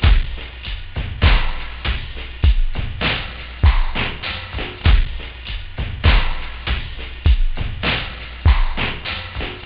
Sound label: garage, techno; OctaMED SoundStudio V1